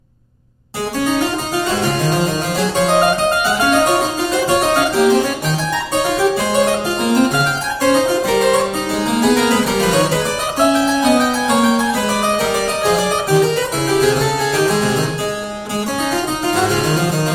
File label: Suite Gigue, Harpsichord; Kreysing the Yonger; Der Getreue Music Meister